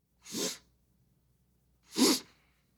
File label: A woman sniffing